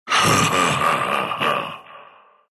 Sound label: laugh, ru; Dota 2